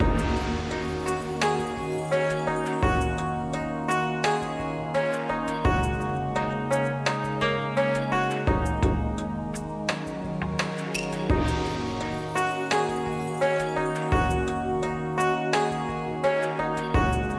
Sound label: BGM Paytable; Ancient Scrolls